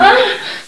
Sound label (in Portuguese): gemido